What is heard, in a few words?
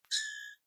grackle